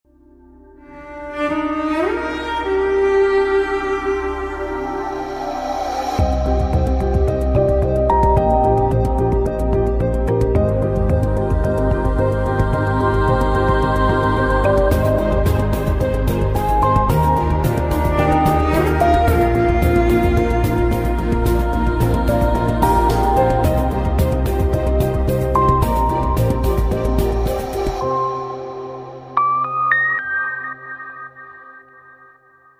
itel signature

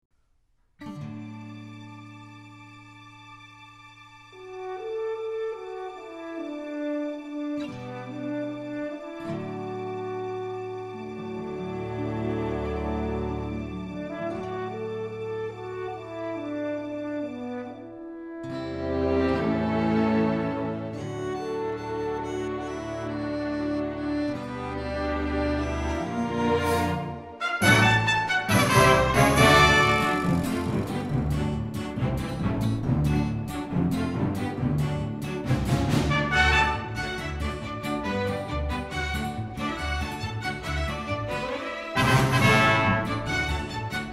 western

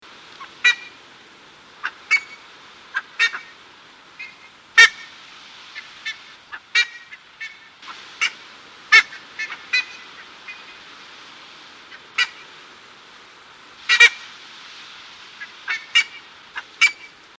ibis

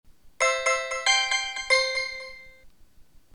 alarm antelope

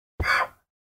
hit

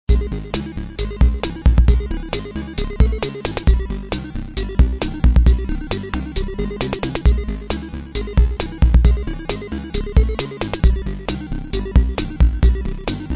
8 bit music

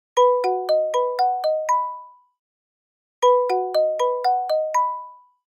galaxy watch kalimba

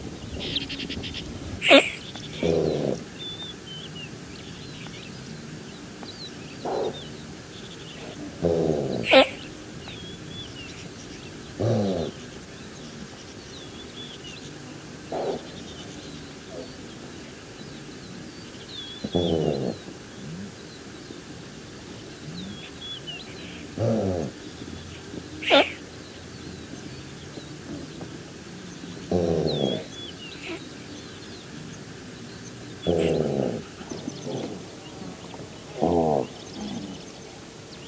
gazelle; gazelle toms

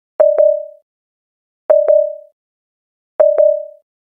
galaxy watch emergency sos

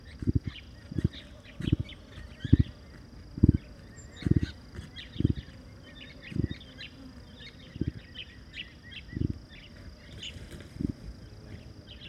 emu

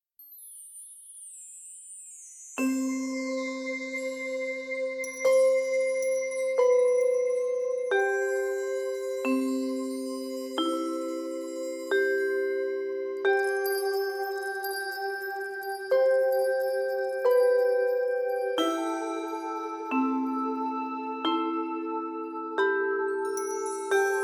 magic, volshebnaia muzyka dlia fona bez slov

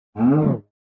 hurt

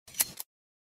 shear

